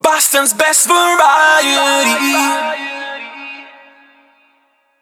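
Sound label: 105bpm MIX, jingle; WBMX 2017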